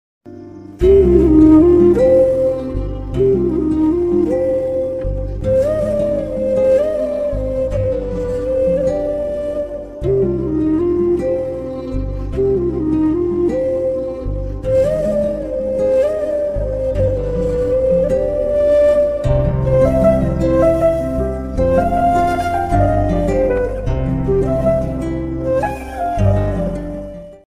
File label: emotional bgm ringtone, kannada ringtone, sad ringtone; 99 movie